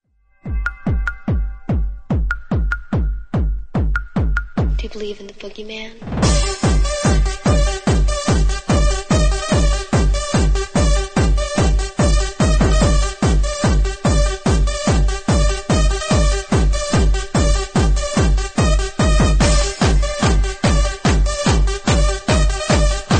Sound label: techno halloween